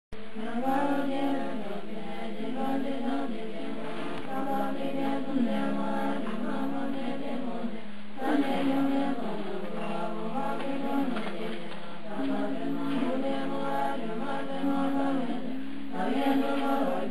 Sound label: Sakya Nuns Chanting; Tibet